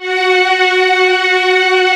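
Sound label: symphony strings F#3; Back In Time Records; Korg Universe VOL-1; P3+SYMPHONY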